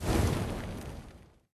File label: Flames Loopend; Hero Beresad